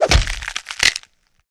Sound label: fighting swipe bone break; soundscrate